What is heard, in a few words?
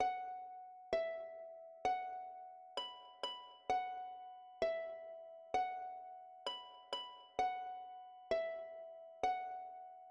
Deer Dance Harp Voice